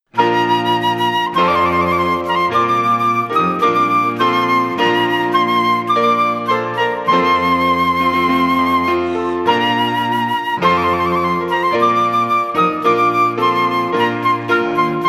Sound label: Trumpet Voluntary